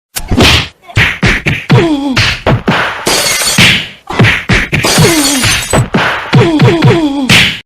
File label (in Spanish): golpes y pelea